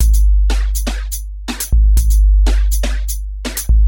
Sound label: Clap beat